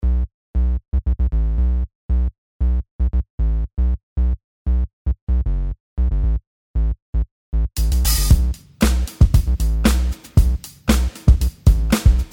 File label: real sub bass square sub; mixing